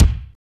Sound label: treble rich steel kick drum c key; tuned drums